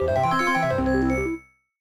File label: Reward Fanfare; 2nd grade musical menace leapster gamerip 2004